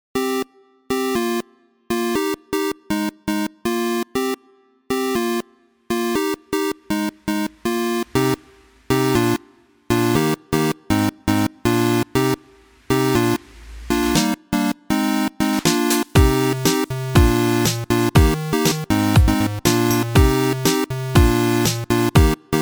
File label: Starter half 8-bit